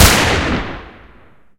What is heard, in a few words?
wing last shot, spitfire weapons; lfs